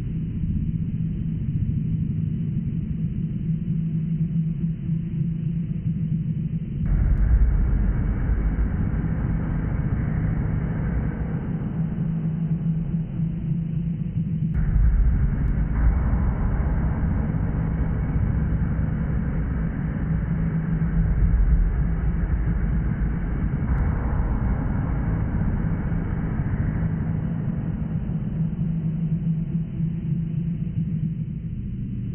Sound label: hallway hum loop; svencoop; rngremake